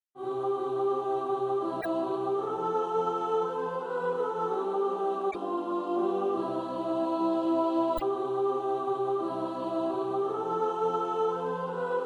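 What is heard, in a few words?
sop, practice music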